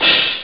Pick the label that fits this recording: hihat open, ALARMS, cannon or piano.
hihat open